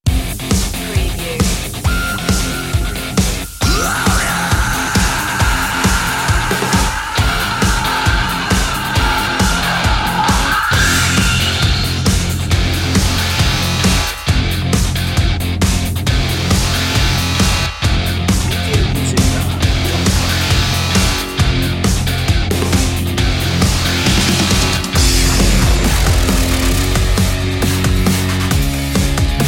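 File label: metal, Rock Hard / Heavy, raucous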